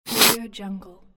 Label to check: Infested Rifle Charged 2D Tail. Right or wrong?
Wrong